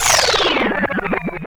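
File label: tape wind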